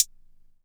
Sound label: Hat, Drum & Bass